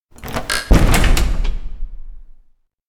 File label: door townhall open bang; anemoi